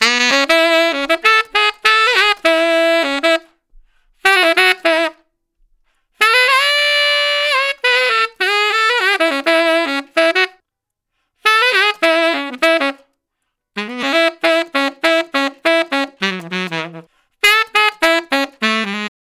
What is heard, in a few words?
Tenor Solo, Jazz, Traditional, Tenor Sax